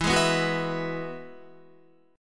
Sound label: E Major Add 9th, Guitar, down; strummed; JGuitar